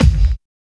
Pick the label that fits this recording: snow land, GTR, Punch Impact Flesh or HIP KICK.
HIP KICK